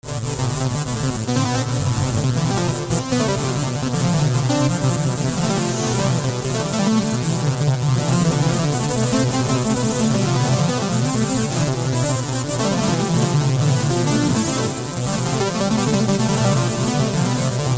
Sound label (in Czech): mikrohymnus; fceli